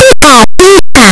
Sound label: pikachu cry; pokeyellow